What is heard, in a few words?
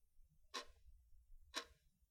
Clock Tick